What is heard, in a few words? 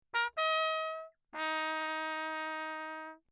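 trumpet mute, Crown, signal; Lechner; Perinétventiltrompete; CrownMe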